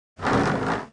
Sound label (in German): Scheibenwischer trocken, volvo 9900; Vehicles; AI volvo 9900; Freyfurt